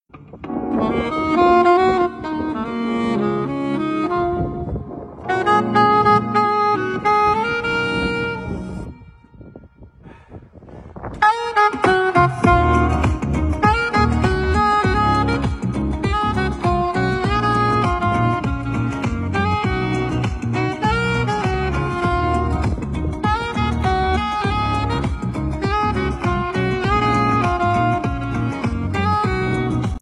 Nhac Chuong Saxophone Ver

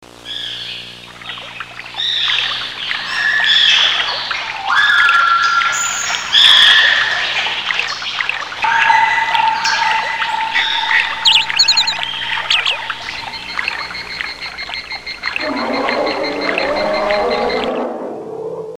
Tiếng Gọi Nơi Hoang Dã Ghê Sợ

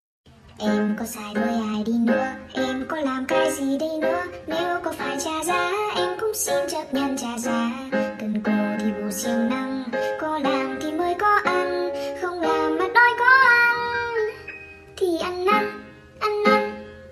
Nhac Chuong Tiktok Giong Nu

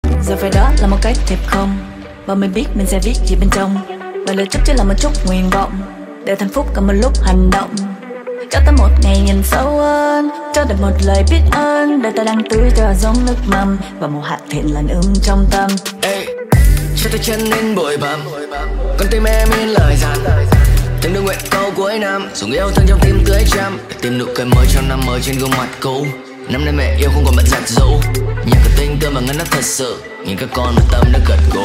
Nhac Chuong (Rap)

